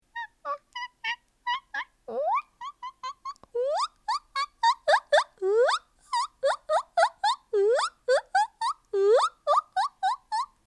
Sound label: supposed bird noises